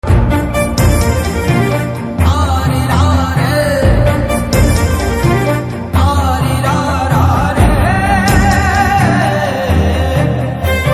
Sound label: BGM Telugu Ringtone